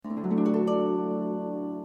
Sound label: notification; nancy drew ghost dogs of moon lake